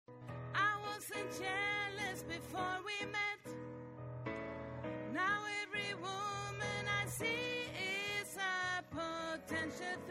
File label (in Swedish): alt, stammor